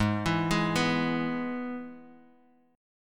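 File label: Ab Minor Sharp 5th chord, Guitar, DADGAD tuning; 6,7,6,4,x,x; down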